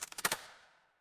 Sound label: door lock